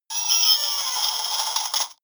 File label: halloween toy vocal distortion